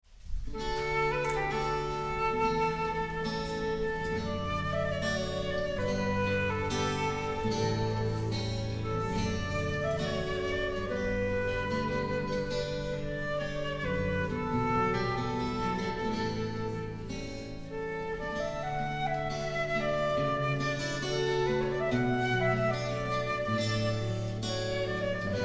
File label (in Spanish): oboe